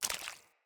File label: Walk Water, Footsteps; Enemy; Mockery; Mockeries